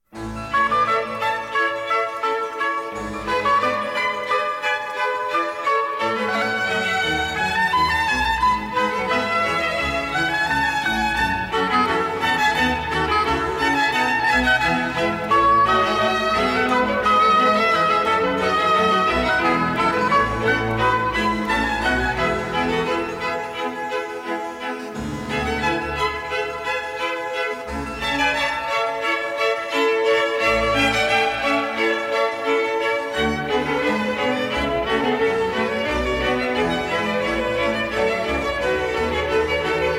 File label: Oboe d'amore Concerto in A Major I. Allegro; BWV 1055